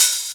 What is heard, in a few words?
DR. LOOP, DRUMS; X-Static Goldmine 3